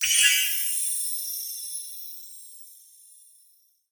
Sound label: Collect Crystal